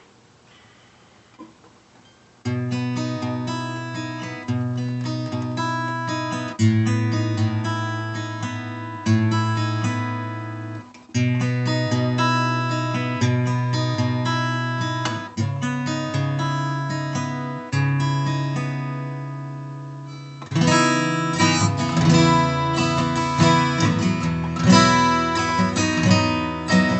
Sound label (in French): OM strum leger